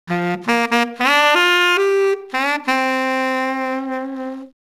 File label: sax